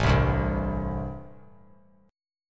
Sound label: A-Minor 7th A; Am7 strummed; JGuitar